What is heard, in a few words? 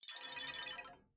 Glass-Clakaclaka